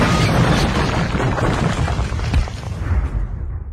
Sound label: tank engine crash sound; thomas the tank engine